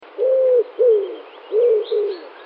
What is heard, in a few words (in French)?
pigeon ramier